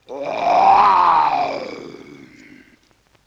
LoFi Synthetic Lion Roar; Vintage Cartoon; Comedy Vocals

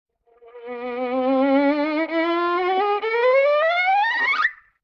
Violin Glissando Slide; Vintage Cartoon; Musical Elements

